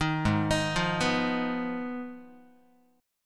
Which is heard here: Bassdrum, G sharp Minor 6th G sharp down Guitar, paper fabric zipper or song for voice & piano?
G sharp Minor 6th G sharp down Guitar